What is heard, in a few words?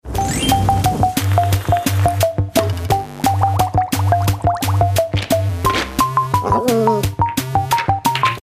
ad music